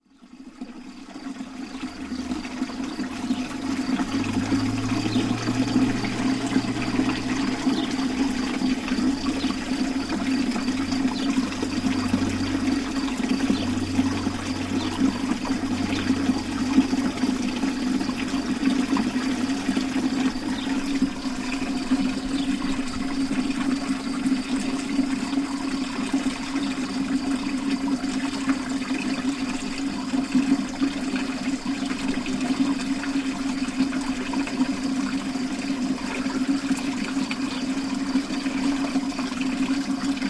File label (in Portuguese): Tanque e fonte; Mosteirinho